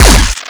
plasma shield off

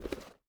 ui pickup rubber; Rust

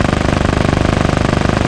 speeder gun loop echo

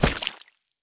body drop puddle; Escape from Tarkov

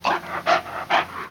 dog pant

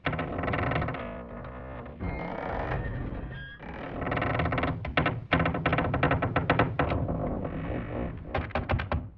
ambient; World of Tanks

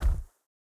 bear footstep wood hard; Rust

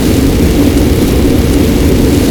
hipcutter target loop

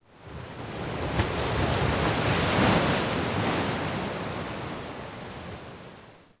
Ocean Waves; Ambient; Civ4

